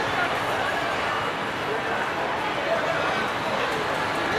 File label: Fun Fair Ambience; Totaled; XBOX